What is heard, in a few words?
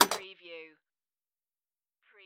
Pinball sound, clank with recoil